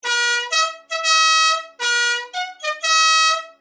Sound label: Wedding March Musical Car Horn